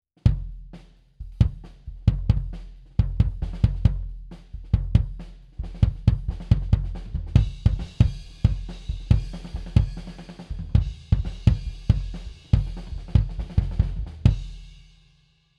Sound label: kick distanced